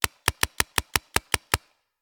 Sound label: Paintball Gun; Sound Ideas; Guns